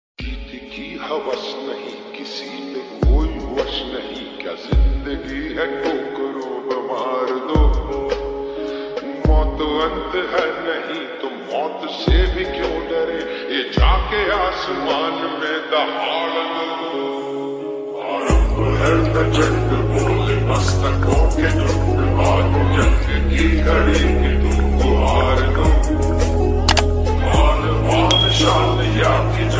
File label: Hanuman Ji Bhakti Ringtone